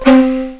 BAMBOO

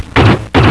PHASER

